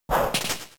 Arm Thrust 1hit; Pokemon; Gen 3; attack moves; RSE

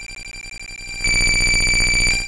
Supersonic

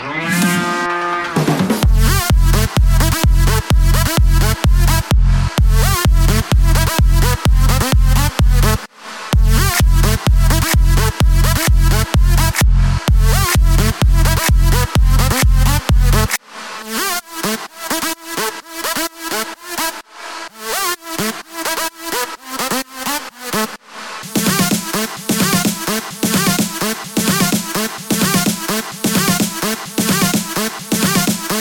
Disco; Flavios Disco; hiberworld android ios online windows gamerip 2019

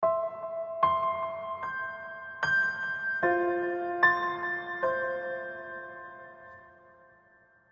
Failed; Zion Zyon; mobile

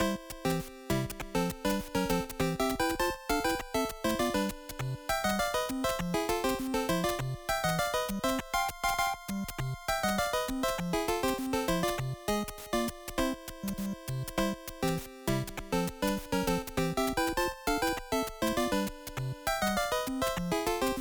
Bonus; super mario maker 2; SMB2

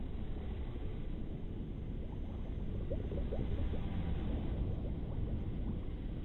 Ambience Dungeon

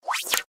Smooth Swish; Infernal Radiation; MacOS

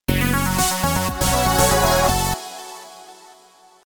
Game Over Serious

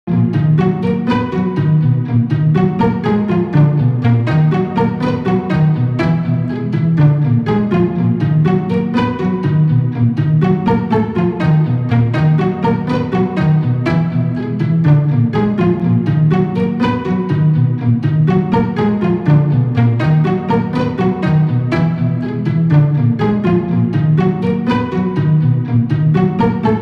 Medley; Luigi's Mansion Dark Moon; Eerie Staircase ~Medley~

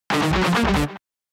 Warning Jingle; Dillon's Rolling Western; Nintendo 3DS

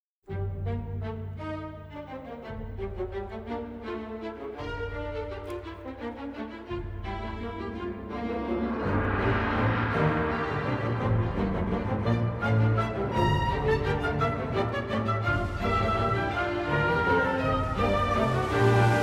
Koto String Melody; Nobunaga's Ambition 30th Anniversary CD Box

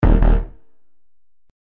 Incorrect Prompt

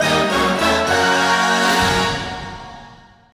Goal Fanfare; crash bandicoot series sound box; 2nd Place

